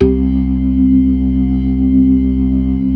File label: ORGAN 4 DRAWBARS; Keith Emerson Signature Series